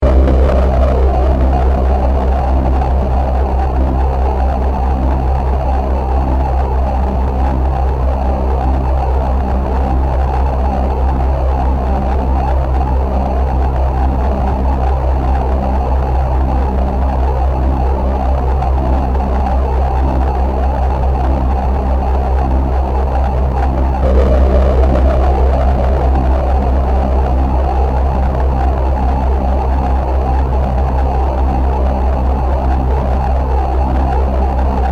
Portal Throb

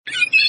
beast sound; Caravan Beast

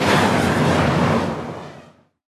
Juggernaut Missile travel

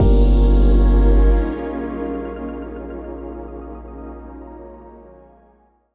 Game Over Gameover Confirm; Kevin

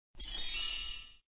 Star & Badge Earned; papas pastaria to go; android

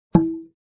Place Plank; super stacker 2 online

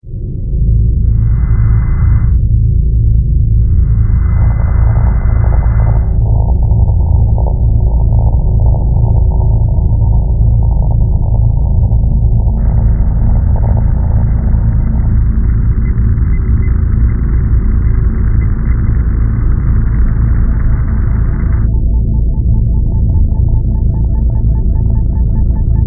Ambiance; From Dusk Till Dawn

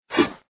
Clang

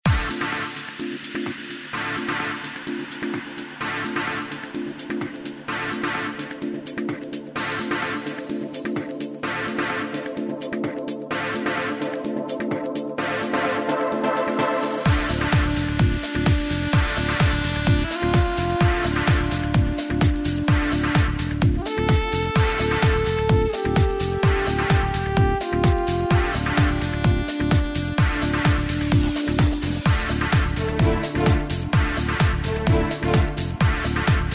Combat Bgm Intro; MicroVolts Recharged

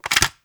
Open Case

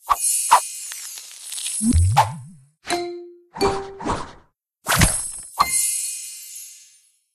Purchase

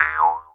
comedy boing; ice age scrats nutty adventure; Insects

